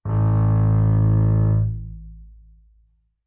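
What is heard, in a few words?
Bass arco fortissimo sul E A2, Double Bass; MIS Pitches - 2014; Strings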